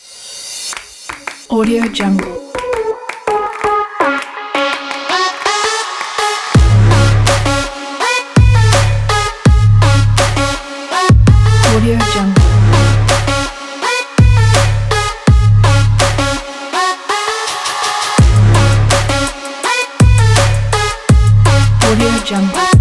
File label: trap